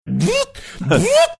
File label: imitating sound power drill